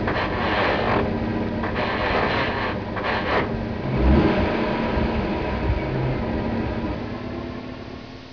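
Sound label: truck gear; 12000 Graphics Pack - A Complete Graphics Collection For Your PC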